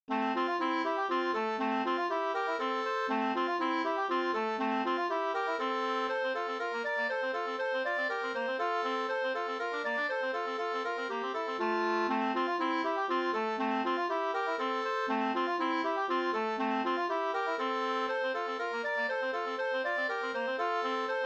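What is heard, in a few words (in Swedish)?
slangpolska, two-part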